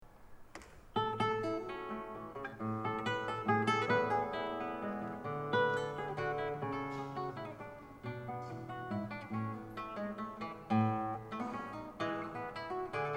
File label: courante; bach